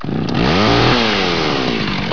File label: chainsaw startup